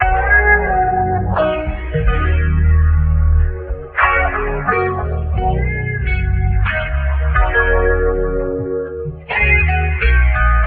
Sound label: guitar too much bass, loops; Phonky